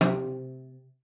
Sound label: C3, CELLO FINGER; Xsample VOL-5